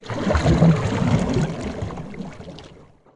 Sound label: Canoe Paddle Stroke Underwater